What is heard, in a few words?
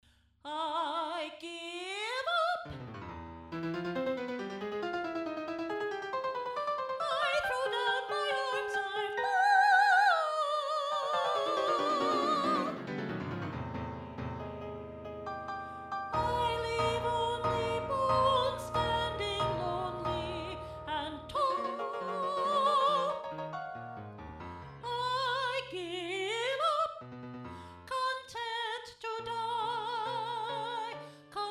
Surrender SOP